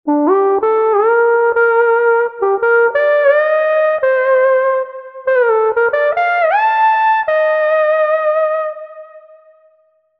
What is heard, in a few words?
Smooth Ld; TURBO VL